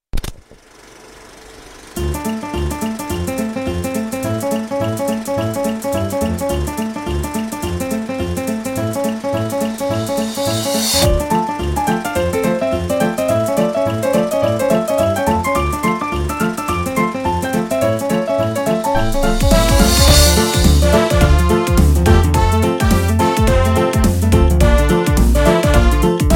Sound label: Remix